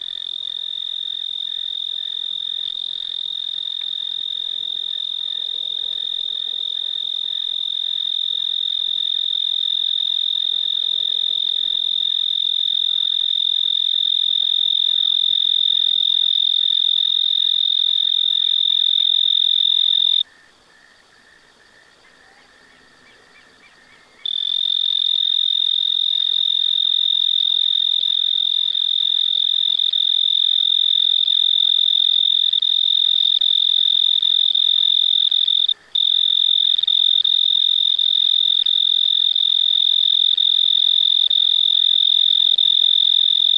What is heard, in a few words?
Oecanthus pictus, 22degree Celsius